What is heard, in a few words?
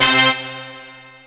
brass